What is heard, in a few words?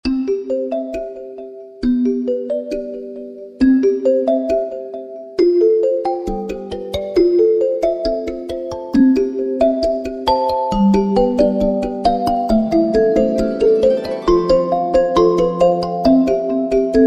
Oppo A7n, ringtone